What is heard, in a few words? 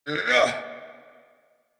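Ability Exert; Dialog; en; Warframe; Veilbreaker; Kahl Barks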